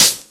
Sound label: natural foot hi hat sound d key, foot cymbal tuned to the D note; hits one shots; hat samples